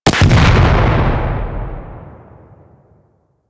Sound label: RPG Explosion; Hellion Elite